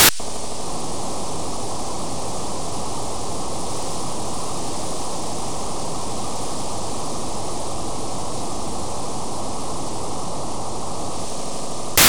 NXDN Voice

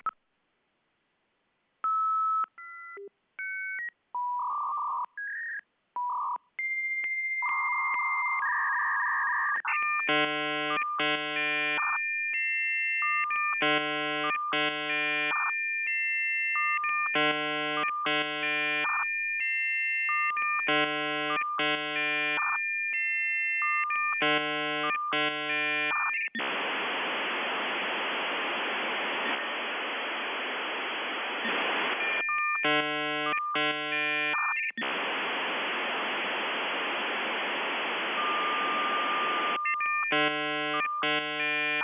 motorola sm56 problematic

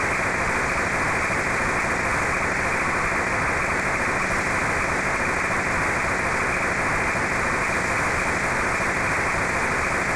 P25 Trunking Control

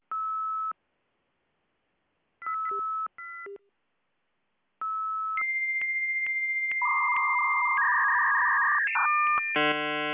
USR Sportster bong bong

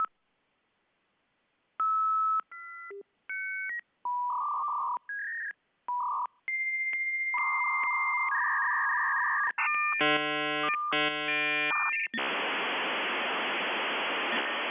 motorola sm56

